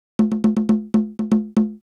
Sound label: Conga Hi; hydrogen